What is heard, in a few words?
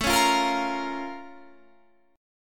Bb Minor Major 7th